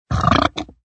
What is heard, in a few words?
Burp Low Growly, Burp, deep, throaty, (human voice); Burping